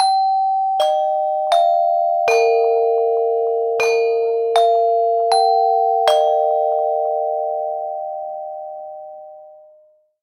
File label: Westminster Strong; Ring; alert tones